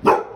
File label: bark